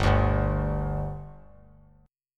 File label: G 5th G, chord; G5 strummed